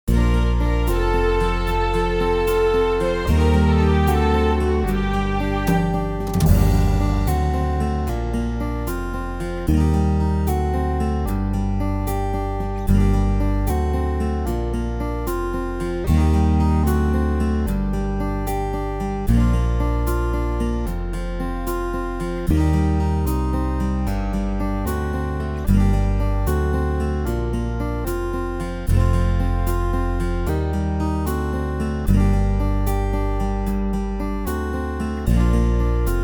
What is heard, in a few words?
the miller of dee backing, bassoon; folk